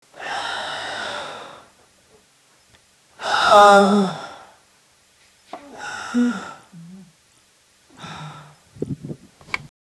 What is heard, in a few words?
young man hard b, pain; handsome